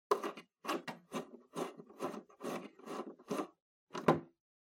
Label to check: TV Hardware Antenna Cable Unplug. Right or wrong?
Right